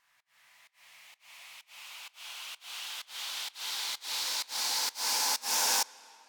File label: Big EDM Drum Loop Uplift Fx, 128 BPM; Future House; Future House Tools